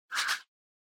Motion Swish High Short; モーション スイング09-07(高 短)

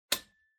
Wall Outlet 2 Pin Unplug

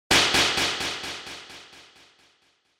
Hit Slap, Long Delay; 打撃 平手打ち; 長 ディレイ

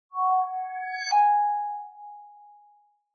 Smartphone UI Sound Speaker

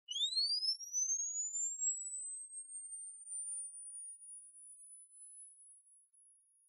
Camera Disposable Flash Charge

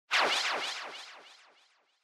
Motion Agility Long Delay

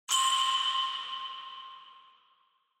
Single Accent Mid

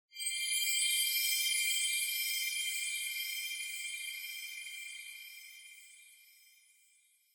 Onoma Sparkle Long; オノマトペ・キラキラ

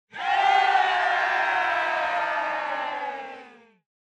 Cheer "Yay" Low Long Solo

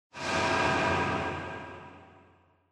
Scene Flashback Short

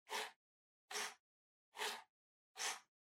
PC Mouse Move Far Short

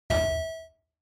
Quiz Button High; クイズ ボタン

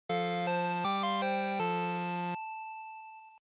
Shooter Game Over, bgm

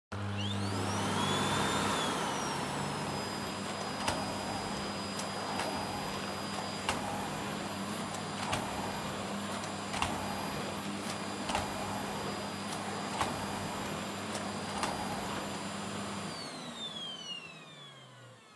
Vacuum Cleaner Vacuum Floor Soft Low; 掃除機03-25(バキューム 床 ソフト 低)

